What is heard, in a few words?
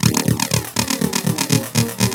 LOOP, TECHNO; TECHNO-BOX; ELEC-LOOPS